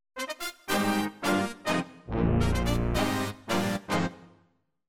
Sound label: Roland Fantom XR Brass